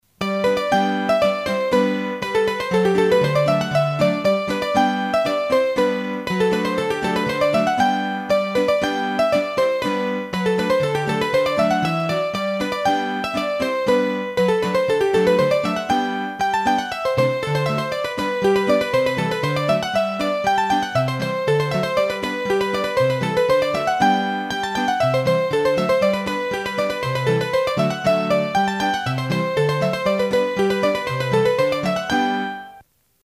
Polka, hurdy gurdy

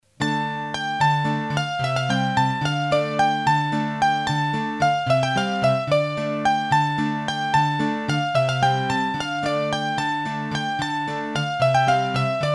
Bourree a Bouscatel, hurdy gurdy; Massif Central; Bourees d'Auvergne

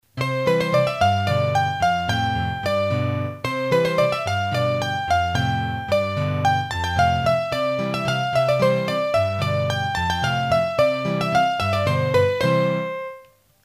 Bourree, hurdy-gurdy, Auvergne

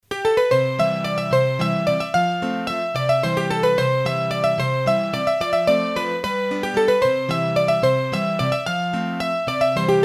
Bourree, hurdy-gurdy, Auvergne

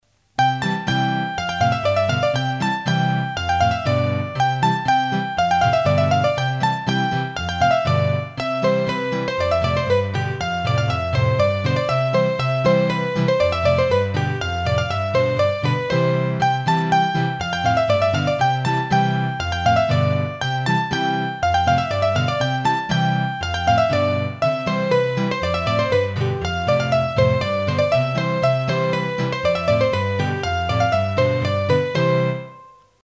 Polka Piquee, hurdy-gurdy

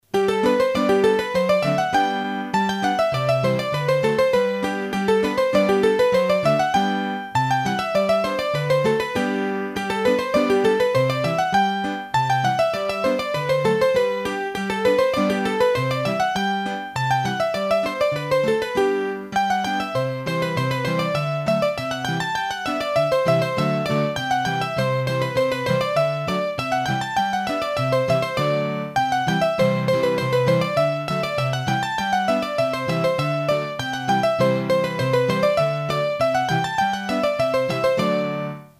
Scottische, hurdy-gurdy; Massif Central

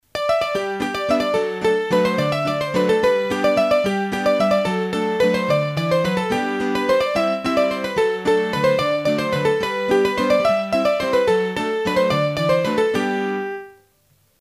Mazurka, hurdy gurdy; Mazurka de Servant; Mazurkas

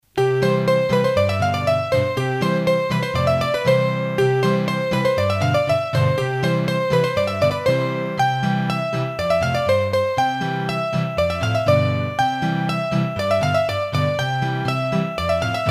Polka Piquee, hurdy-gurdy

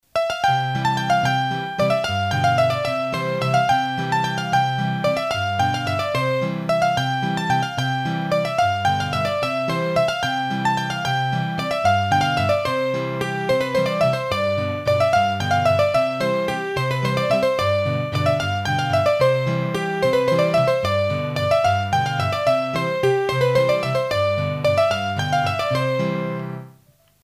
hurdy-gurdy, Valses; De Delai Lou Ribotel; Massif Central

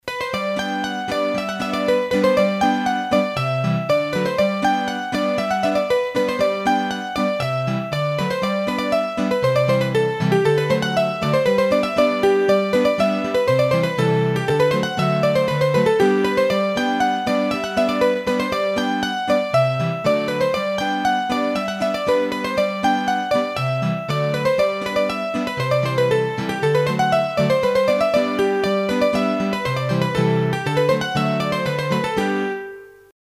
Polka, hurdy gurdy

